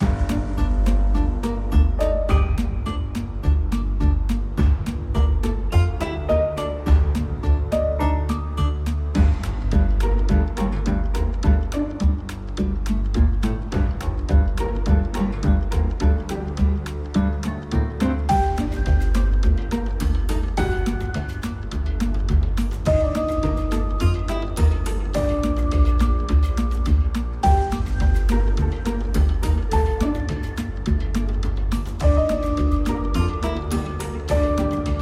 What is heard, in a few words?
Bakery Suspense; Hello Neighbor 2